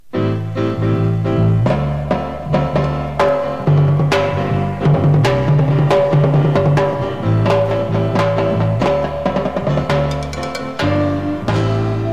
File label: afrorock